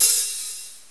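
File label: open hi-hat; jazz jackrabbit 2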